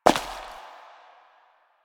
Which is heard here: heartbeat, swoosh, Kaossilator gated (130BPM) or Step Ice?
Step Ice